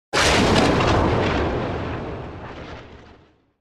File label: Thunder Clap - Light Fast; Hollywood Edge; sound designer's toolkit v2